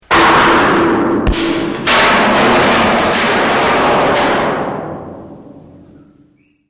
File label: ROCK ON METAL SLIDE REVERB, Object on a metal playground slide; Rocks; Materials